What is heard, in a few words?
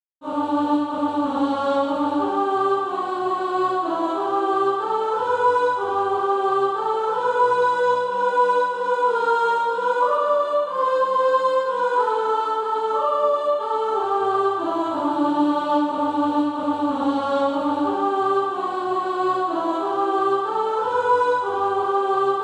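soprano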